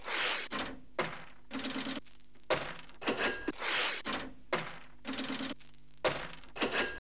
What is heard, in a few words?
cash register